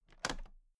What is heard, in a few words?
toolbox latches open regular